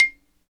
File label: XYLO, Afro Xylophn